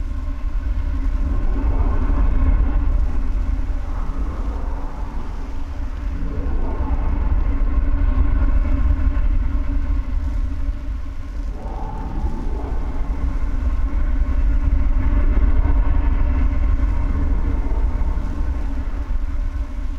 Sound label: Fire Elemental Idle; TANK; 3Souls